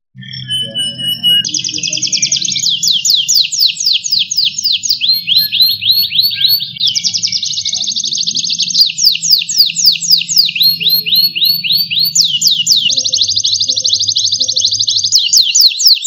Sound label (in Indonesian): kenari mahadewi